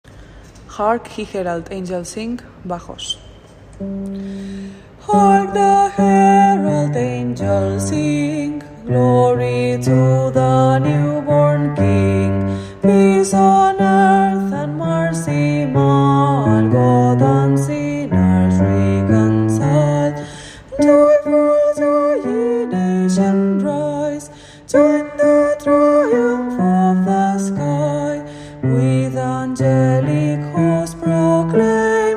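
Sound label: bass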